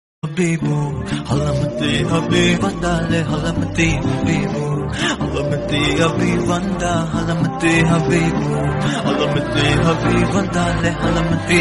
Kuthu Arabic Bgm

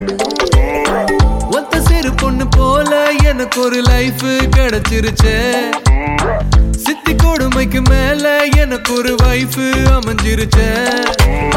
Ringtone

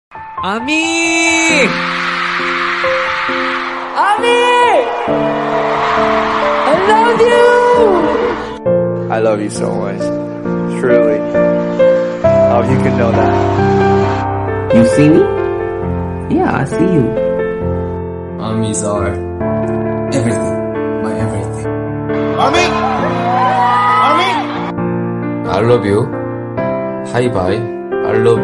Shouting English

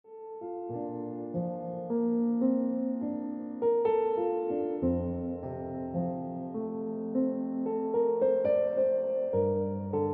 Piano Music

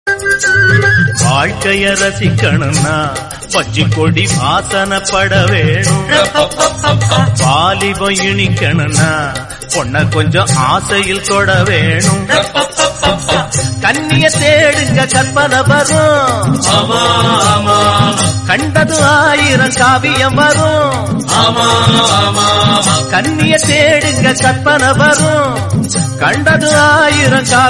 Tamil Song Ringtone

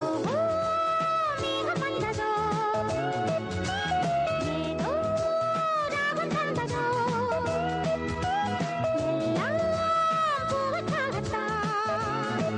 Tamil Rain Songs Ringtone

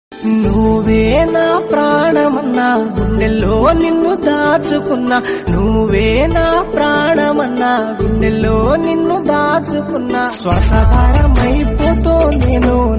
Tamil South Indian

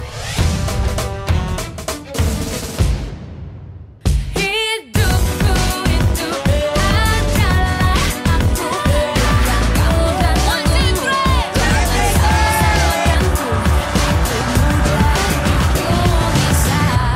Ringtone

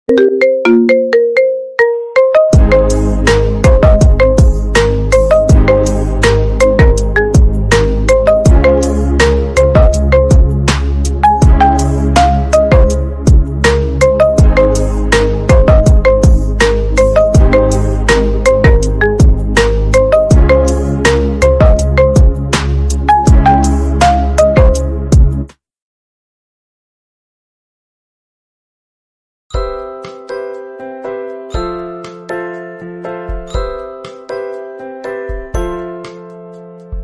Marimba Instrumental Tone

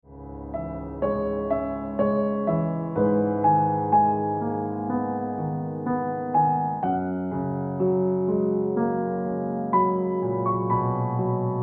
Ringtone Piano Music

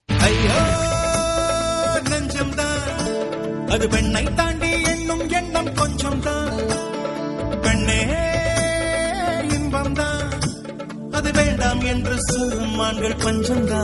Tamil Song; Thuppaki

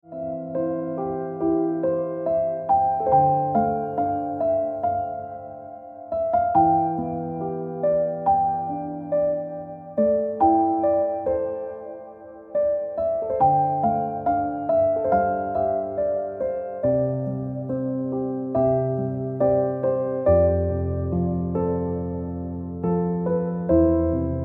Piano Music

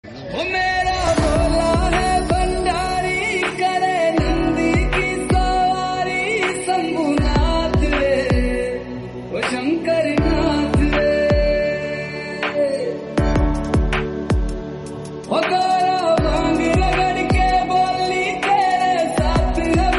Lofi Hindi

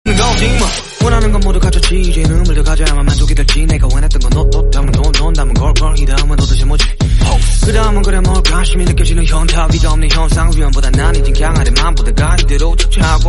Korean Rap Song

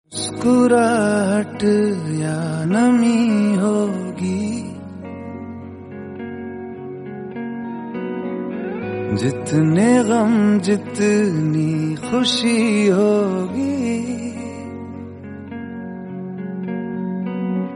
Sad Hindi

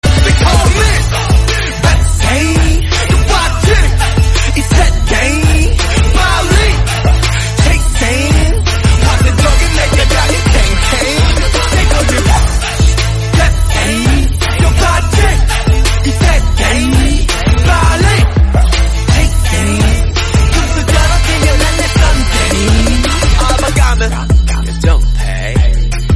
Korean Song

